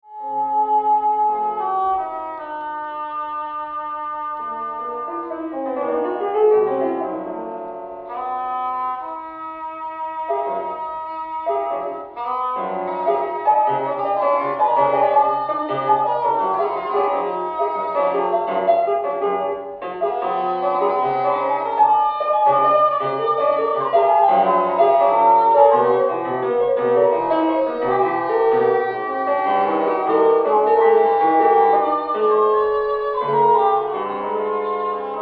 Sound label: English Horn and Piano; development of theme1